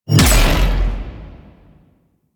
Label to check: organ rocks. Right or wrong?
Wrong